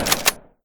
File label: pump forward, shotgun; halo